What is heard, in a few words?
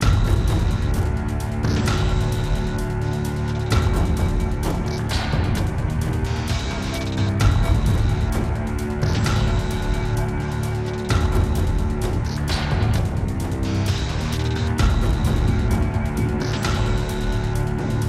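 Music Bunker Battle Loop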